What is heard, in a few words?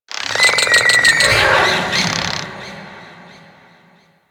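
Settlement Distant Insect; Ambience; Warframe; Grineer Settlement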